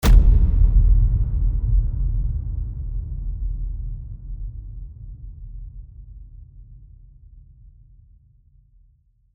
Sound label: Epic Low Hit